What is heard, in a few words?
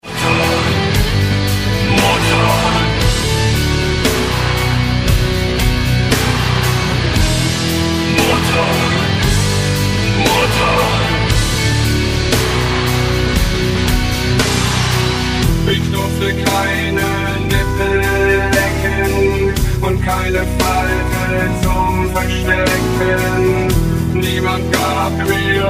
mutter vocoder mix